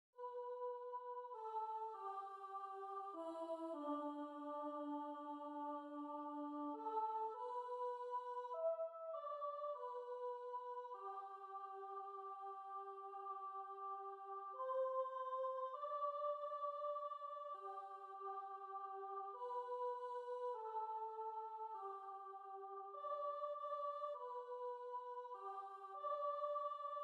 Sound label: choir